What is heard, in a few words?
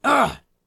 Male Hurt